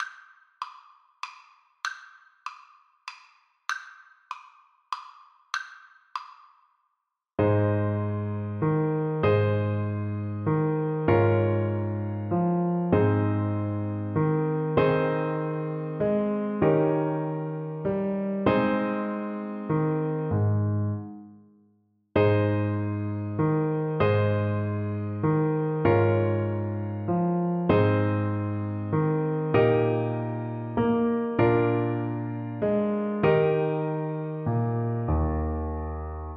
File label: tit willow, Saxophone